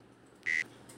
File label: beep recorded; nrf5340 audio le code